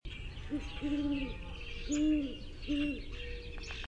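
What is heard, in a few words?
Great Horned Owl hooting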